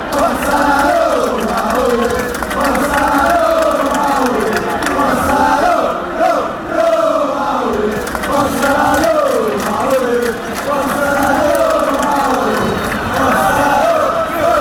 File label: come on ole, chants; roma